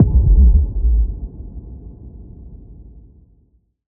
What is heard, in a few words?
Boom Low; drones / ambience; increased control studio